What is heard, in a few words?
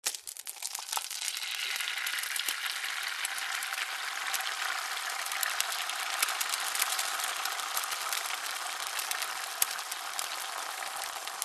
frying egg in frying pan; food; zapsplat